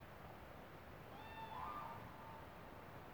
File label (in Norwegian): fugl